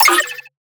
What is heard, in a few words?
synth hit Computing Noises; Virtual Riot